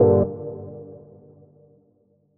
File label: Stab Dub Gmin; One Shots